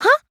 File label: huh; mahiru